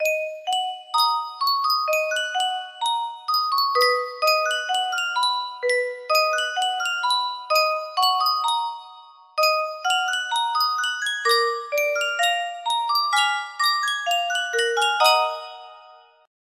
yunsheng music box

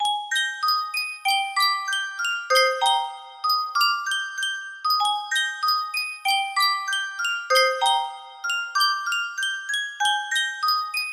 Yunsheng Custom Tune Music Box, music box melody

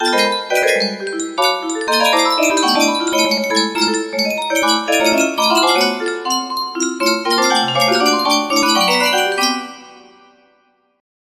melody, music box

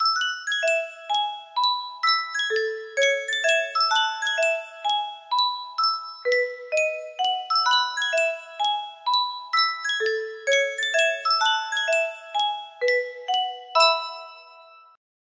yunsheng custom tune music box, music box melody

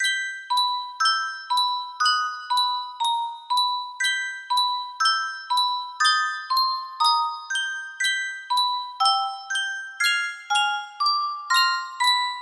music box opening, music box melody

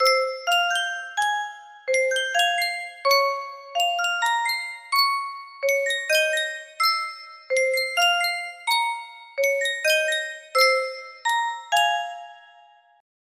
Sankyo Music Box, music box melody

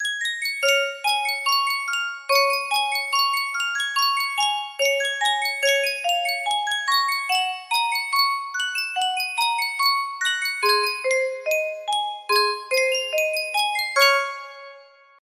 yunsheng music box, music box melody

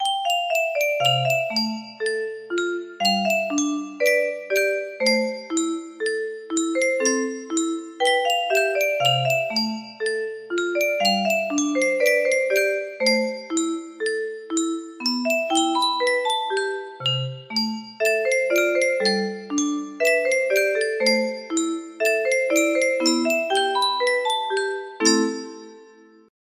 30 note, music box melody

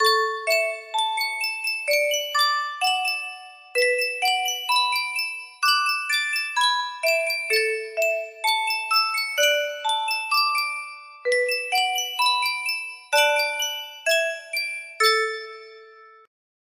Yunsheng Custom Tune Music Box

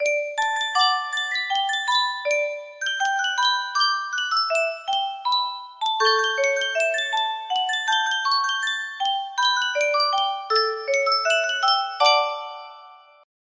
yunsheng music box

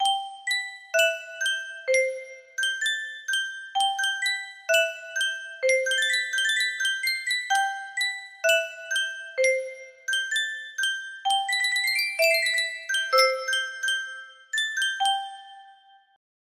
sankyo custom tune music box, music box melody

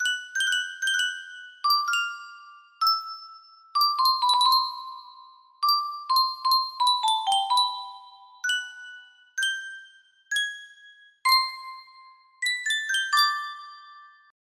Sankyo custom tune music box

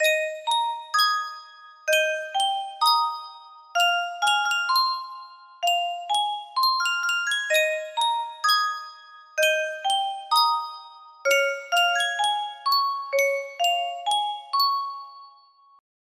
Yunsheng music box, music box melody

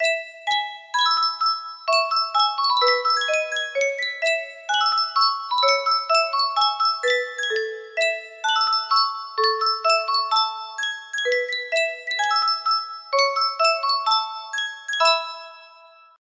Yunsheng custom tune music box, music box melody

